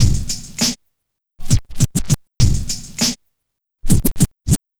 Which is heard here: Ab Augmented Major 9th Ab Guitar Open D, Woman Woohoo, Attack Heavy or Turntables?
Turntables